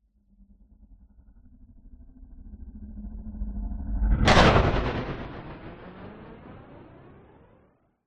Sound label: sweep growl into metallic hit and flanged tail; sound design; zapsplat